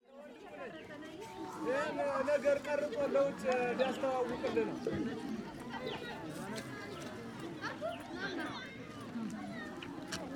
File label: Ethiopia Market large outdoor voices walla birds and activity; Charlie Atanasyan